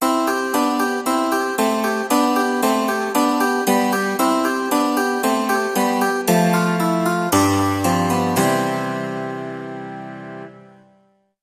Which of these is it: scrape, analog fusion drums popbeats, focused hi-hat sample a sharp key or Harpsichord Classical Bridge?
Harpsichord Classical Bridge